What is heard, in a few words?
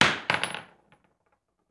Bullet Shell; Sound Ideas; Guns